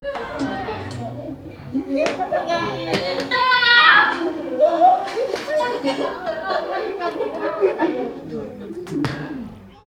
ambient sounds of five 12; indoors

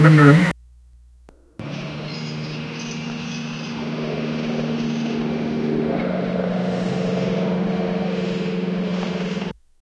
helicopter blades thrum

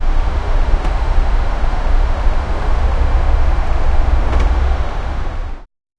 corridor background

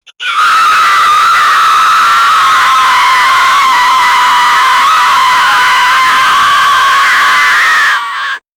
horror sound burning, undead

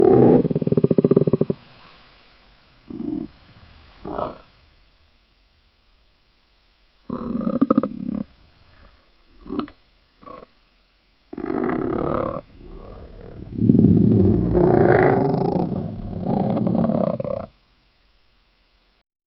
crab sounds

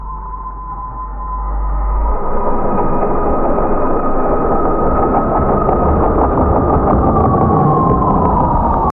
10 second cinematic cyber

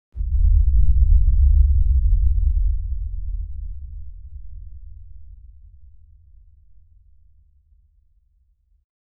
soft but sharp muted thud

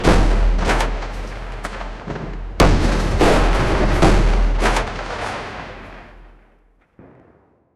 epic ancient battle sound, horse hooves

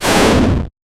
flame burst attack sound

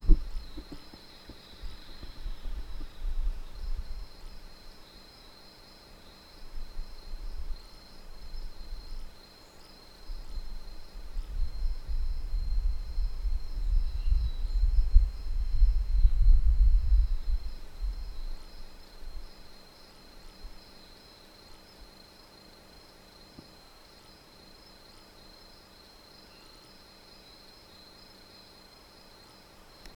nature night scene on a, temple

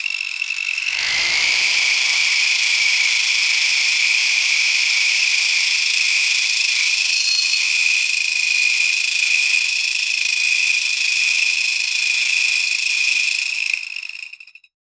a cross plane v8; motorbikes

